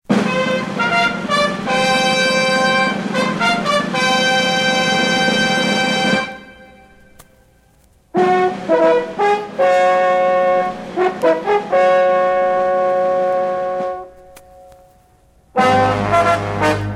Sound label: Period Fanfare; Cartoon Music Discs